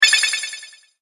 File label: Teleport Fantasy Spell, magic; fiction sound effects